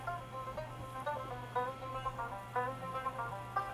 banjo

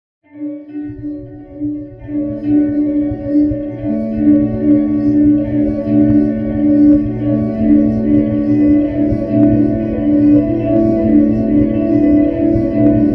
Twinkly Guitar

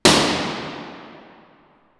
Impulse

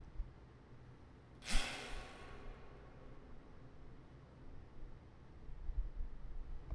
reverb off fan

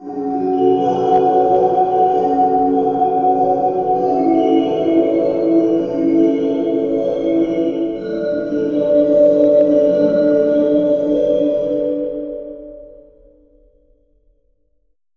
kalimba

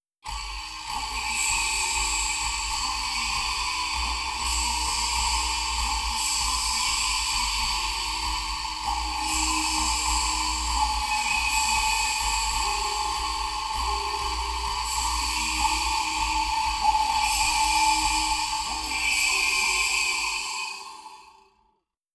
Vibraslap

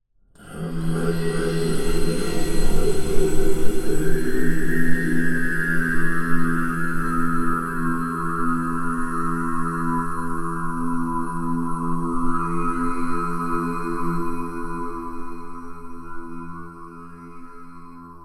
Glass Processed IR

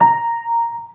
high A sharp

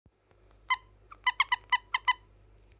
squeak

